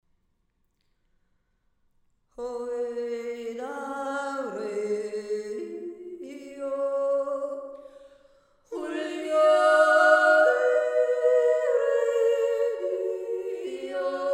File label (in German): Südwandjodler